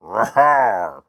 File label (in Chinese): 庆祝声; 掠夺者